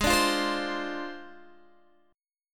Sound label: G sharp 6th Flat 5th G sharp Guitar Open G